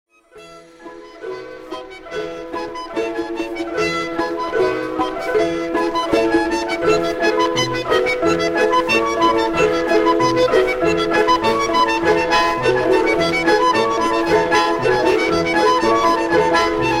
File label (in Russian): Лирическая мелодия